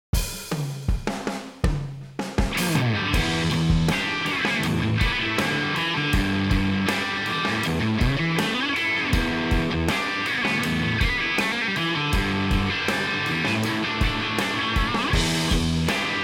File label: CRUNCH SOUND; Nenbrini; Divided 11; Class A